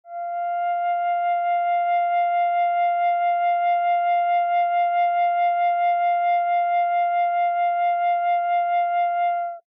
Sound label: Flutes Single Note, Small F